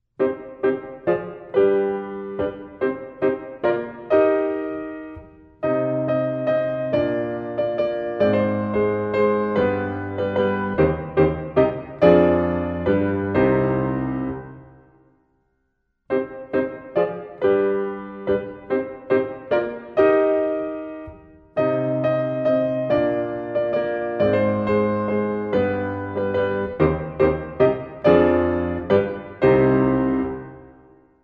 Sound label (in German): piano solo kinder, piano kinderlieder; Beim Ritt auf dem Knie